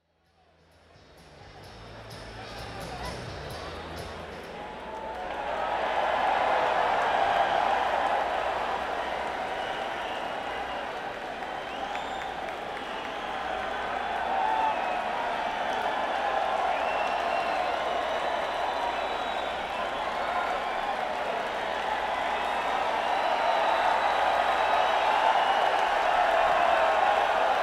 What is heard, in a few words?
Crowd; Columbia, MD - Merriweather Post Pavilion